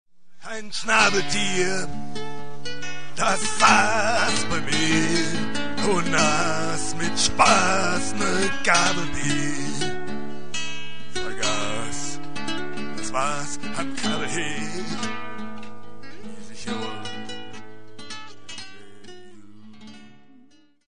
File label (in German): schnabeltier blues hook